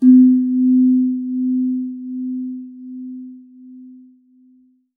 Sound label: slanthem; Saron